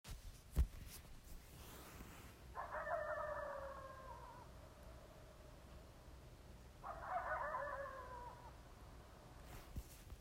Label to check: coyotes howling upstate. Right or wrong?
Right